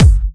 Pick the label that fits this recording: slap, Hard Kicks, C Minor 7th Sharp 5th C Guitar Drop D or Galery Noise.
Hard Kicks